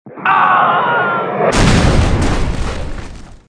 Death Explosion; hl2dm

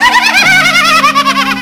hahahaha

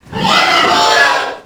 pig chase; A Machine for Pigs

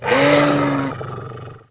attack; tf; slender; therium

